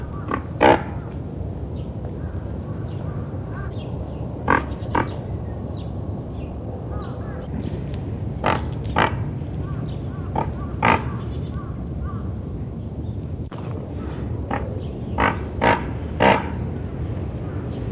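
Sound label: momoiro pelican, モモイロペリカン 鳴き声; 多摩動物公園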